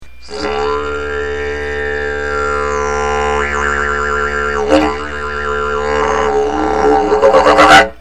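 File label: didj, didgeridoo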